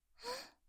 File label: cute gasp